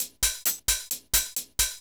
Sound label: HiHat Loop, Dance Beats